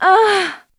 SEX; Zero G; Man Machine

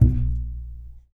TUB BASS A#1

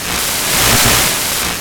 DISTO; USB Soundscan vol.33; AKAI; Disjointed Textures

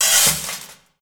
Spade, hits and bits; Zero-G; Total Drum Bass

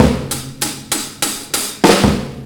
97.2 bpm, Breaks; BONITA; POTBreaks

